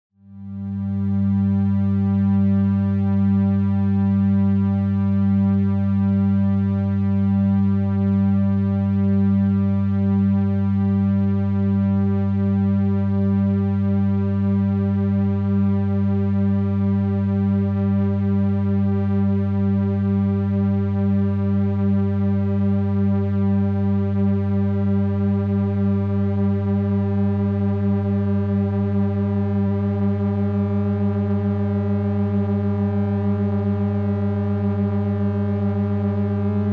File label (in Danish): Musik for et 2 toner G og et andet G